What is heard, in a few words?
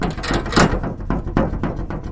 113 bpm, strange bendy metallic beat